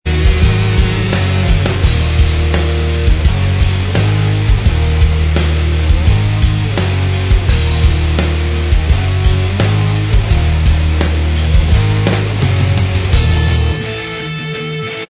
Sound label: Inst Track